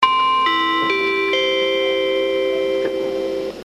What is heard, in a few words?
train chime; スーパー白鳥; ７８９系のチャイムです。; チャイム５は青函トンネルの案内放送で流れます。